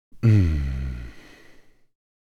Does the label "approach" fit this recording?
No